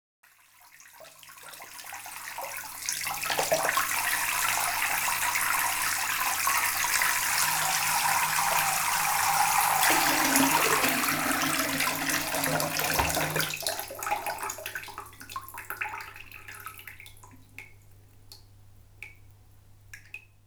Acqua

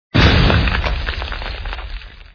esplosione

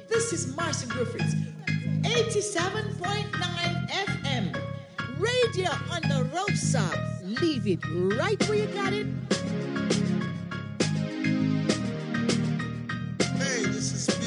spot reggae

